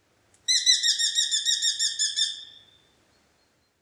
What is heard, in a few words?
Eurasian hobby